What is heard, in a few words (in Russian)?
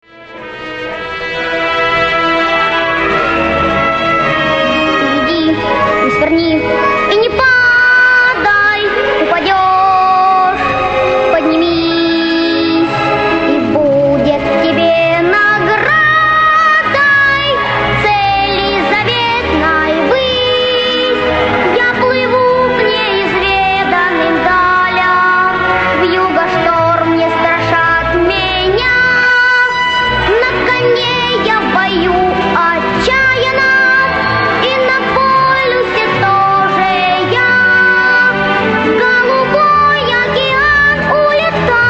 Детский хор, Финальная песня (появление и затухание, шум)